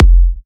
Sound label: Kicks F; markus hakala